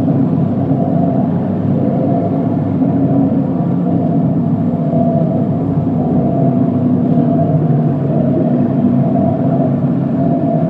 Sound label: ambient science ship hum; Stellaris